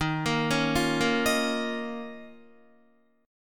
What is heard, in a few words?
Eb 7th Suspended 2nd, Guitar; 11,13,11,10,10,10; 4E,4A,5D,5G,5C,6F; arpeggiated